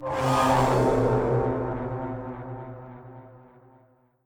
Stinger; Datacore Merit Beat

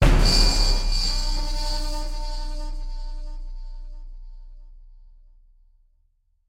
Stinger Jump Scare; The Park

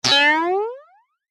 Bouncer (Jump); Twee; Windows

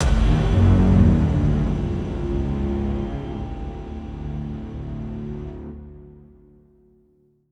Lose

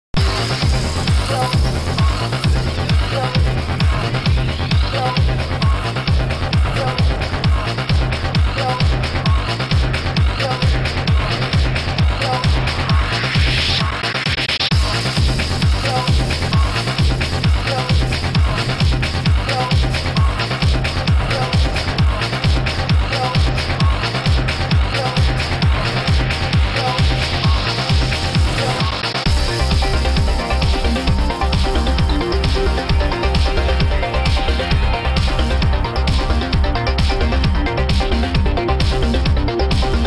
Combat Simulation

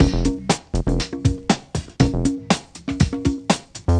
Loading Loop

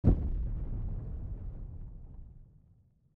Shell Blast

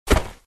Poke Dirt; endless world idle rpg gamerip 2019

